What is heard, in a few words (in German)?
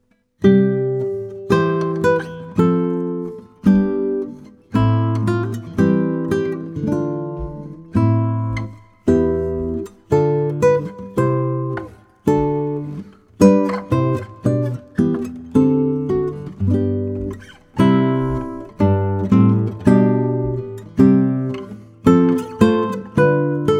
Gitarre; Hörbeispiel 4 - Lobet den Herren, Nun danket alle Gott (Gitarre)